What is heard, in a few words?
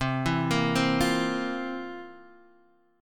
C 11th C down Guitar Half Step Down